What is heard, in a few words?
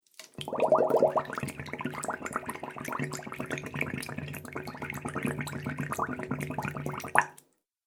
Water Bubble Sounds